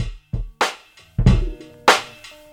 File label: Break